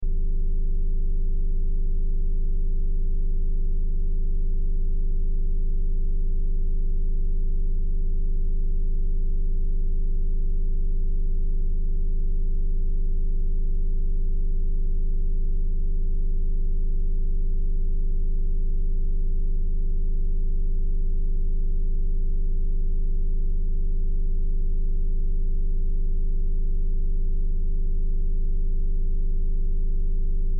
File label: Sci Fi Energy Field Flutter Drone Loop; Lasers